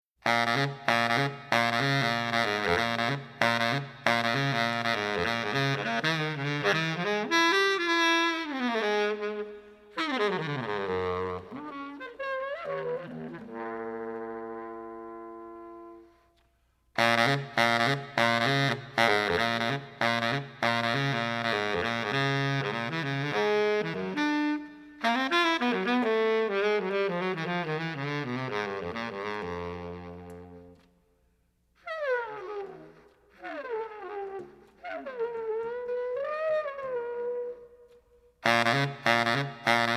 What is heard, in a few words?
Funk